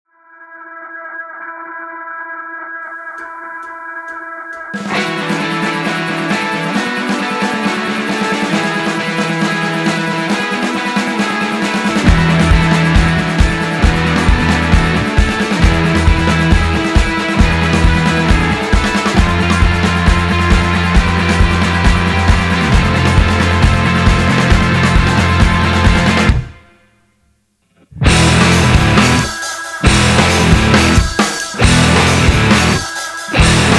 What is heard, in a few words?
(Instrumental)